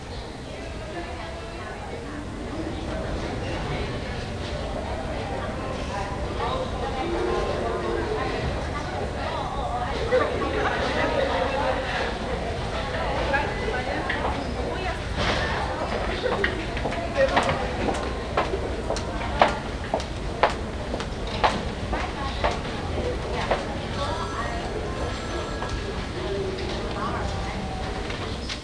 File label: store ambience